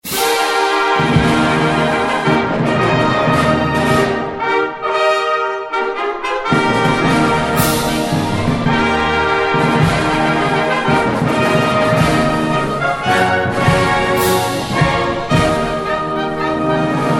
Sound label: Arktis Fanfare